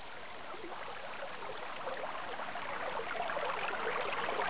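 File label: murmuring of water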